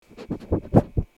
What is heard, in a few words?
sniffing animal sound